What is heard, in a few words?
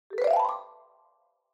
Xylophone Up